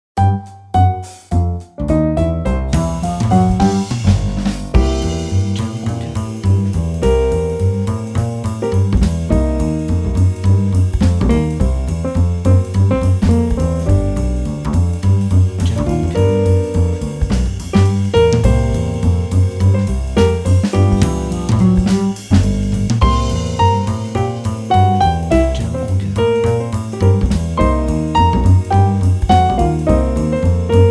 Jazz Positive Happy

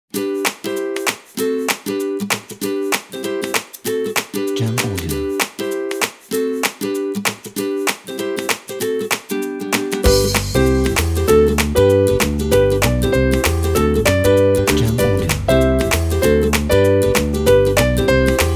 Happy Background

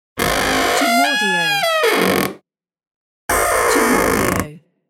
Short Scary Creaking

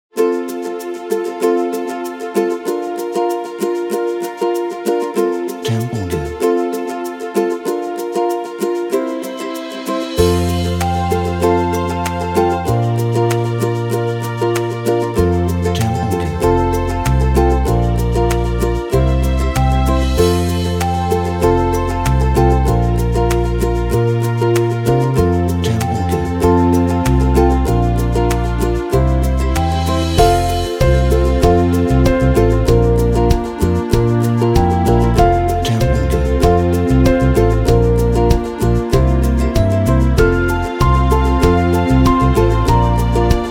Folk Upbeat Summer

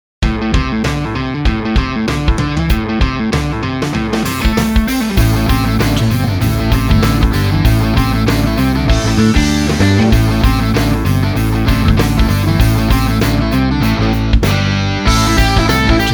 Hard Rock Speed Sport

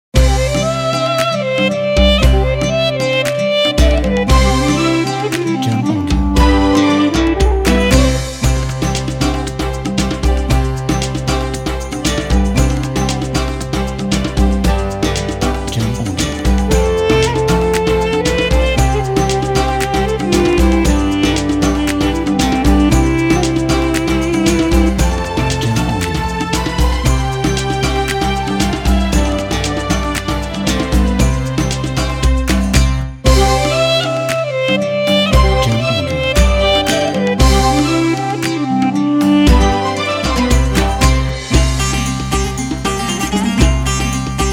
Ramadan Happy

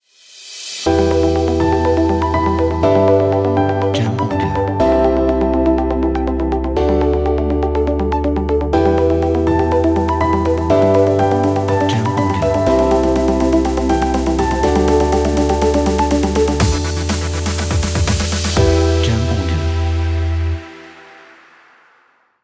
Opener Uplifting Intro

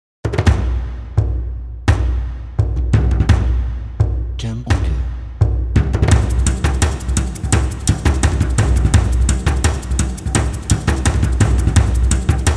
Percussion Ethnic Intro

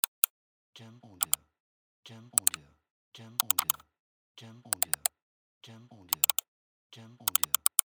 Mouse Click

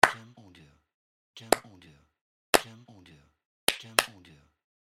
Clap

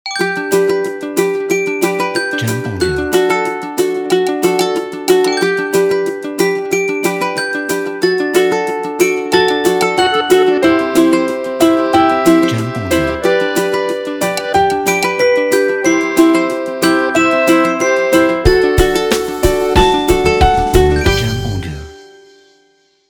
Folk Sunny Intro

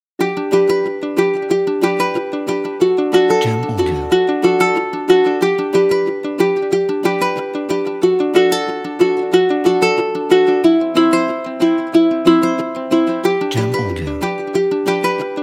Kids Ukulele Bells

